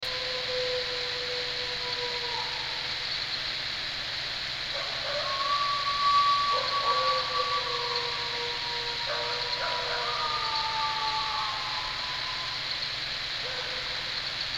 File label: howling; subspecies